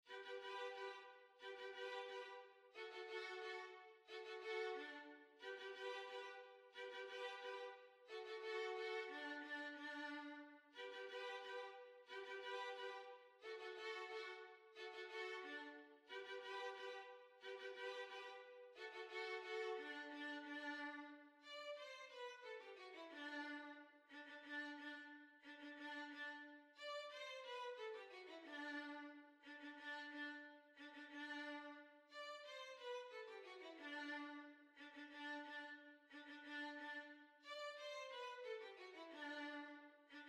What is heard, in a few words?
Violin